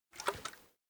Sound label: can juice pass; awayuki; anemoi